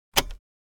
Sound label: Opening suitcase combination lock